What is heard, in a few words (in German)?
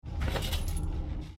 inventar oeffnen uisound; divinity original sin ii